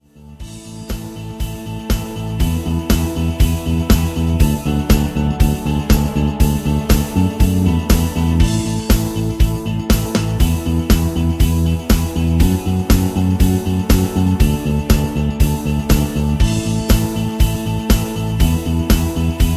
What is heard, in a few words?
rhythm